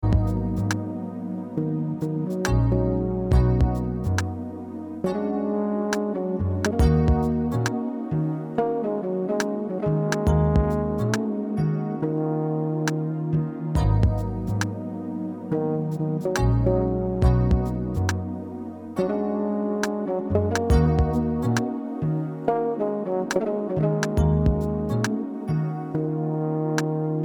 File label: (INSTRUMENTAL)